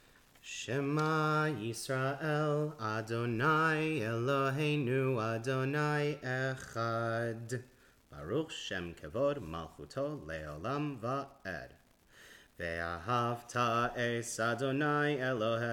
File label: Shema with Melody